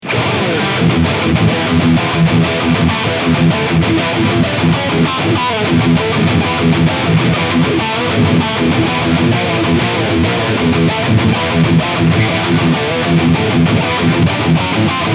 LTD Arrow Black Metal High Gain

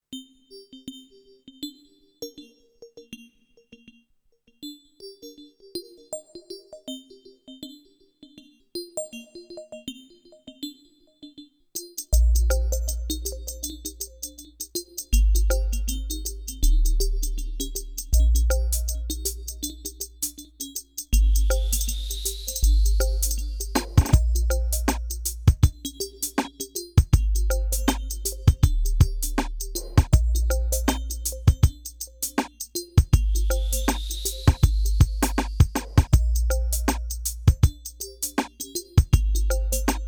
Roland TR 8S Pattern

